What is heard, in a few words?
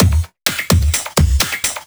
Housebeats 128BPM; Vengeance Samples